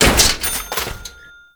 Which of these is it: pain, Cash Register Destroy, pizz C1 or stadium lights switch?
Cash Register Destroy